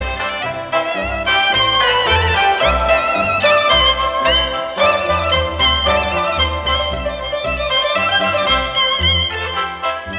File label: Chinese National Classics